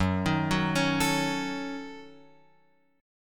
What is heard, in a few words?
Gb Minor 6th Gb down Guitar; arpeggiated; JGuitar